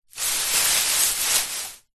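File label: tinsel decoration christmas short handling; foley; zapsplat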